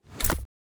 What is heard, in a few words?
ui submenu close